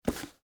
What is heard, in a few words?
Boot Pick Up; Foley; Dressing; Blast Wave